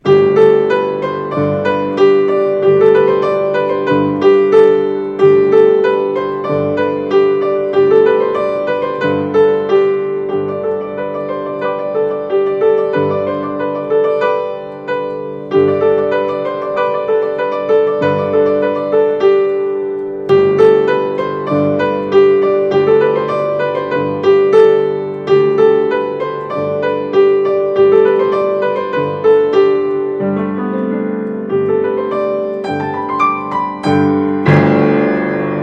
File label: bells, piano